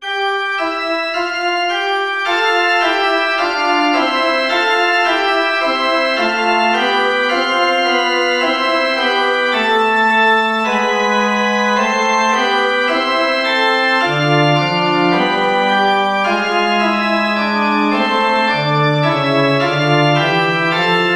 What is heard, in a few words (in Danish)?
forspil